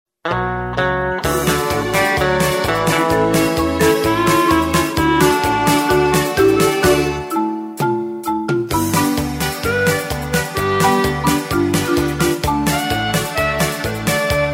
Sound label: music